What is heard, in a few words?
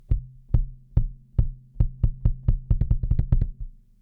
kick